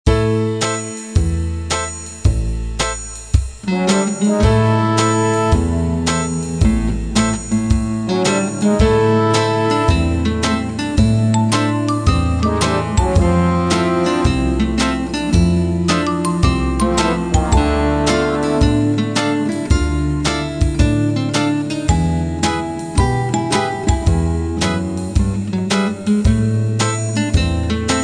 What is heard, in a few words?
Guitar; Gitaarboek; Guitar Festival vol.1